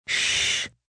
Sshhh, Shhhh, Short, Whispering; VoiceBox

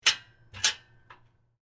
Gate Handle Unlock; Foley; Gates; Doors; BlastWave

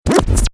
Turntable Scratching; DJ (Sound Effects:Musical)

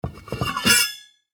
Household Cookie Jar Open Lid Metal; Kitchen

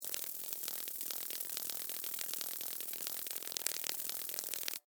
Insect Wings Fly, Steady, Bug; Animal; Wing Sound Effects; Animals

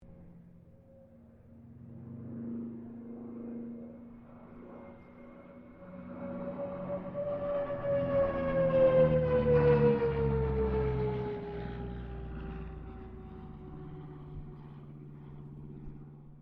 AIRCRAFT CA-13 BOOMERANG PASS DISTANT; Airplanes

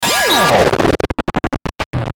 Sweeper Glitch Distortion Ascend Static; Production Element Imaging Element Accent Transition; Sweeper Sound Effects

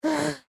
Human Grunt; Grunt Short Male Toddler; Sound Effects:Voice

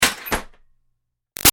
Ironing Board Metal Fold Up Screech; Miscellaneous Household; Big Room Sound

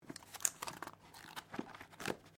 Book Page Heavy Flick; Book movement; Books; Offices

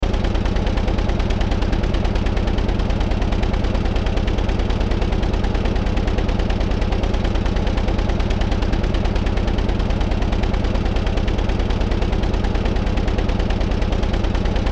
Motor Engine Loop Large; Motors; Machines; Industry; Sound Effects:Machines; Blastwave

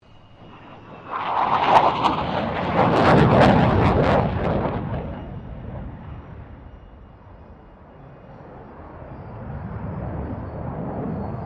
AIRCRAFT FA-18 HORNET PASS

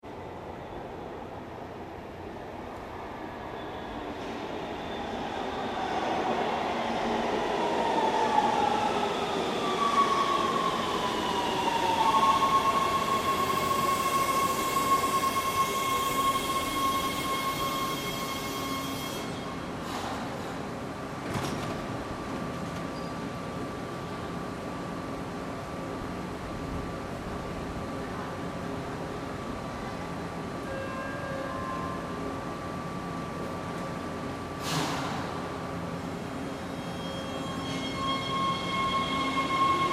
Subway Train Arrives And Leaves; Subway train, underground train, arrives into station, doors open, warning bell; Train Sound Effects

